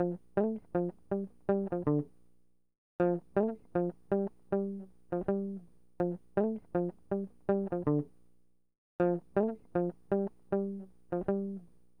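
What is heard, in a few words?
guitar 80 D minor; ProSamples vol.34 - Hip Hop & RnB 3; Got Rhodes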